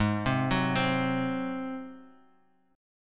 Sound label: F sharp Suspended 2nd Flat 5th